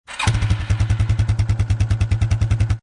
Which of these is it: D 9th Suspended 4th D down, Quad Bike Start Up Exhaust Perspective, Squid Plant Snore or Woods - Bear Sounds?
Quad Bike Start Up Exhaust Perspective